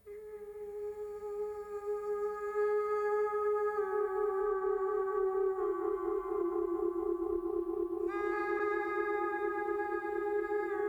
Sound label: melodica